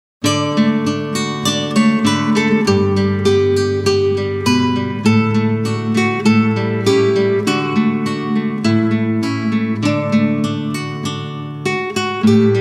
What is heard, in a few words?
romanze, Gitarre; klassisch